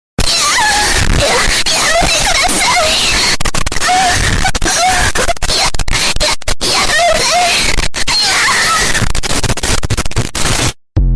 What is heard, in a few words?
loud prank sound